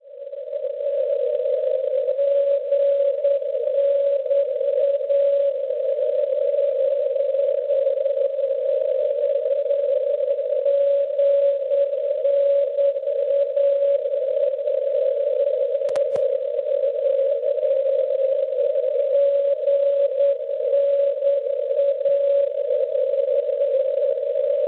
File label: NDB 284 kHz; GNA; Gorna; Bulgaria; Schwarzsee